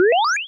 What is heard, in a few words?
button Select